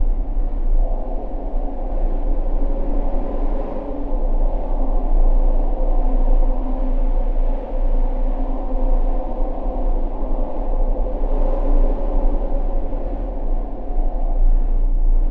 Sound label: deep solar wind; LOOPING AMBIENCE